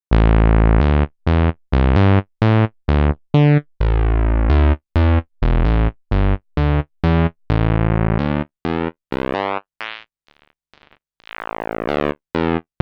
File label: pwm saw